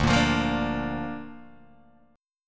D Minor Sharp 5th D down Guitar DADGAD

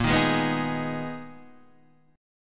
Ab Minor Bb, Abm/Bb chord; Abm/Bb strummed; JGuitar

